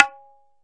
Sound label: ROLAND SPD-20 PERC TABLA